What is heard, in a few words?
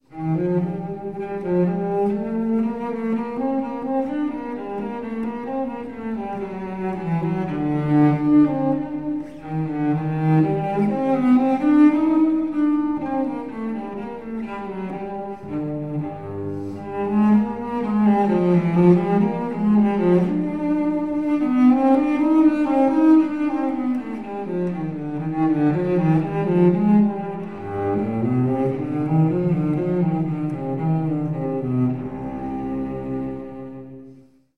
Bourree